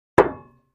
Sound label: Impact Metal Sheet; flexing metal